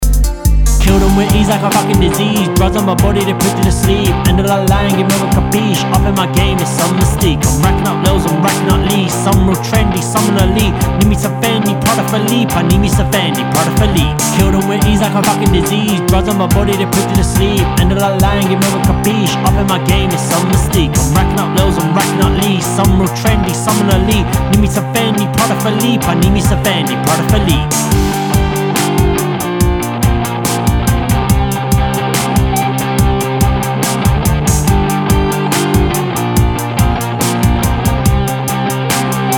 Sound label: Chorus guitar idea